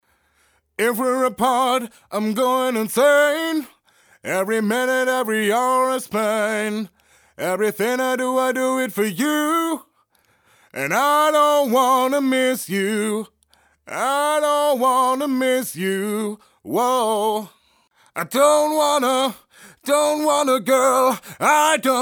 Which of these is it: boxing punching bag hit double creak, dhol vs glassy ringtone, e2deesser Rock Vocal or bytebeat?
e2deesser Rock Vocal